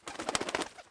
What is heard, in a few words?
Ambient Sound; pangya fantasy golf pc